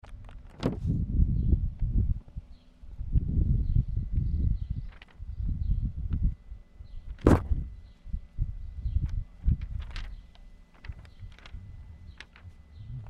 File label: paper in wind; HUDSON